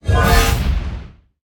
bigaxe

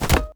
kick violin

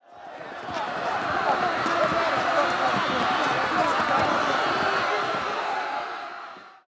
audience chaos

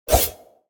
sword whoosh

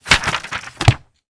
book drop

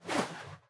whoosh

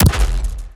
black shield

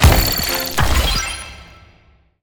earn coin

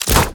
gunshot

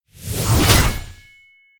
halberd

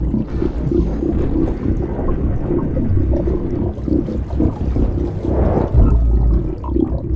sea city transform

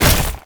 mecha smash

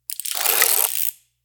music box

